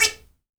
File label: toy up, toys percussion